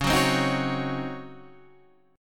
C sharp Major 7th Suspended 2nd Suspended 4th C sharp, Guitar Open D